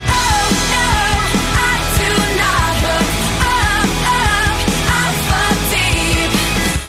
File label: whoop